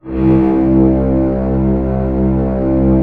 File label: orchestra C1 PP; Sound On Sound - Peter Siedleczek's Orchestra